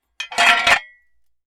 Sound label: Metal; TOOLKIT vol.2; DET24 ElementalMysteries